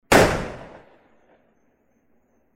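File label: small bomb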